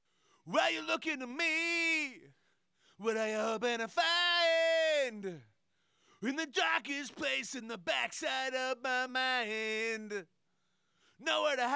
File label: Main Chorus, Vocal Tracks